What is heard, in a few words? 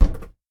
Basketball